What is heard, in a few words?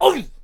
Hwacha Attack Vocals